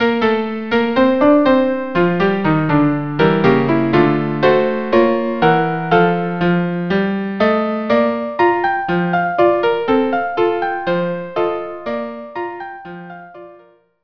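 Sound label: violin viola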